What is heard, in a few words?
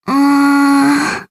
sigh